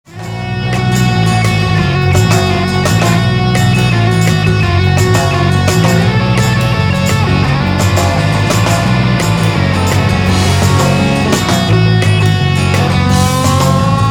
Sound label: Solo